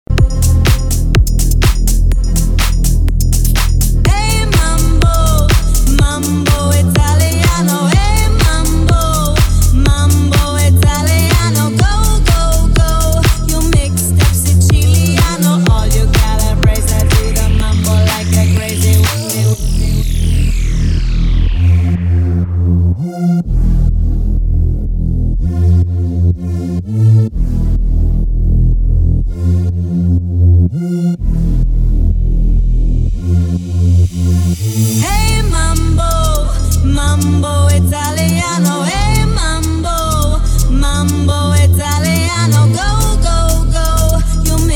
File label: Club Party Banger